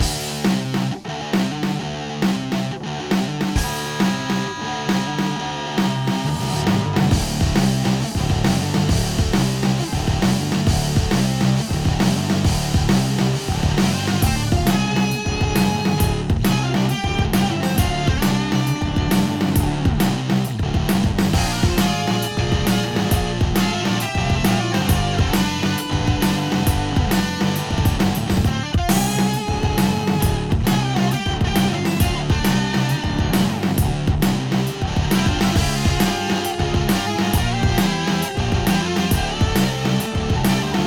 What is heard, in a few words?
forest loop; bgm